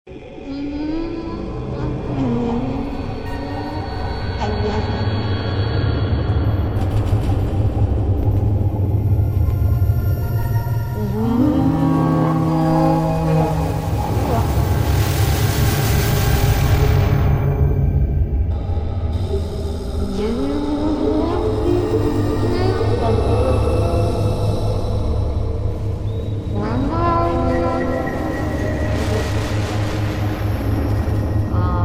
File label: very scary dark sci fi alien horror sound effects